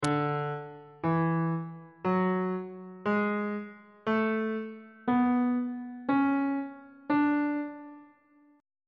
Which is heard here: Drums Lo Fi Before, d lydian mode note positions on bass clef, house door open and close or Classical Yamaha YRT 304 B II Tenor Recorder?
d lydian mode note positions on bass clef